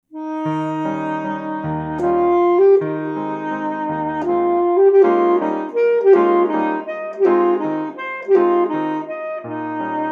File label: Evening Waltz, Gretch; grade 1